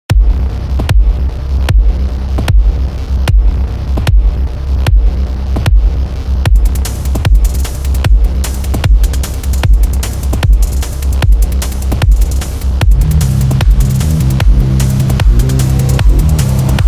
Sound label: Electronic Rock